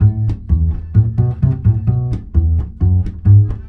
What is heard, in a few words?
BASS, Balkanish Traditions